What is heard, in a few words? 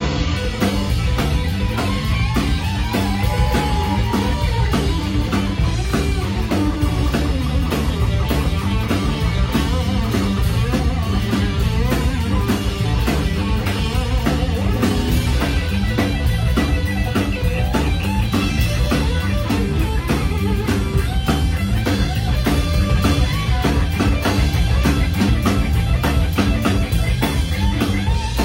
Dazed Guitar Solo